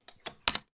clothes hanger hang on rail

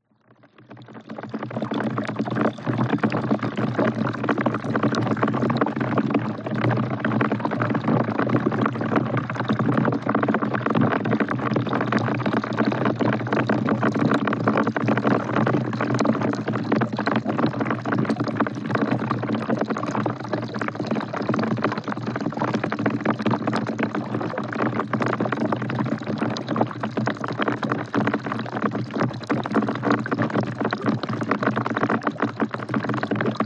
rain falling into bucket hydrophone underwater

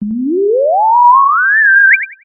synth theremin robot wobble power up medium

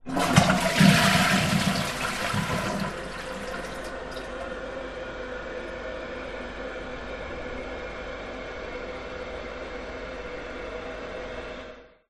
household toilet flush lid closed

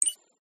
USER INTERFACE SIMPLE

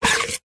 plastic cones stacked pull apart; foley; zapsplat

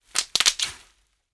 foley bubble wrap pops

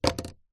household hairbrush set down wood floor

